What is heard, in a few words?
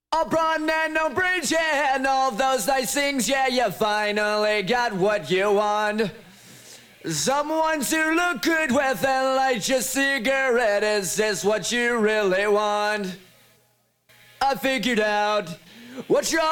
vocals